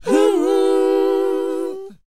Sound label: WHOA D, Vocal R&B & Dance; ILIO; Vocal Planet